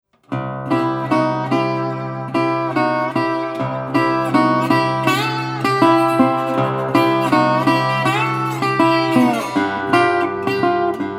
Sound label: ragtime